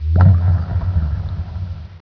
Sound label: echo, cave